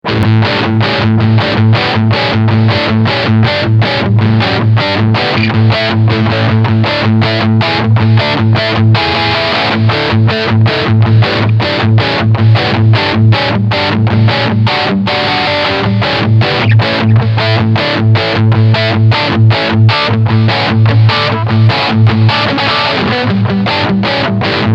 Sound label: Crunch Riff HB Front Mod